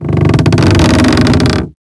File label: trap door creak; Max Payne; ragnarock